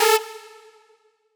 synth

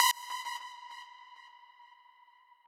lead

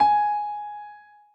piano

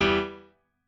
piano

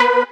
Mainlead Melody

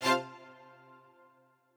strings

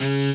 cello

